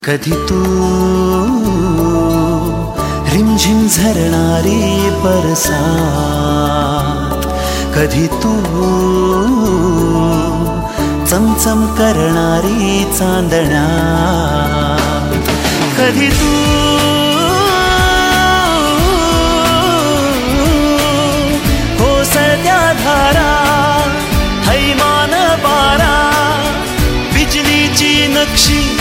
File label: Marathi Romantic